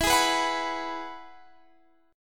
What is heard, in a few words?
E Major Add 9th, Guitar Standard; strummed; JGuitar